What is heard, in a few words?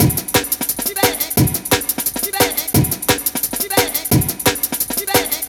175, classic dnb breaks